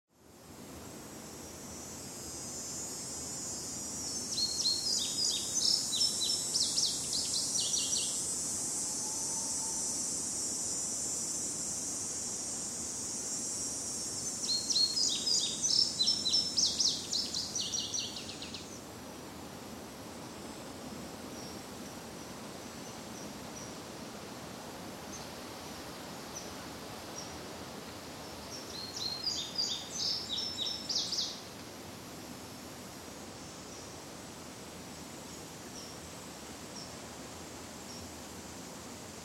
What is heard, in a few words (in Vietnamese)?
Tieng ve keu va tieng chim hot mua he